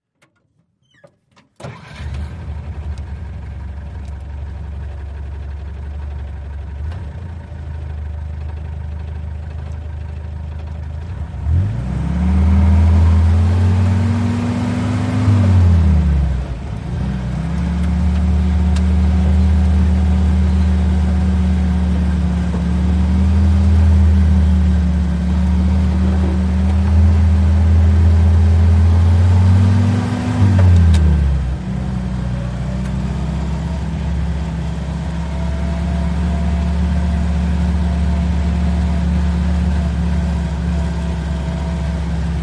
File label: Army Jeep Int Med Spee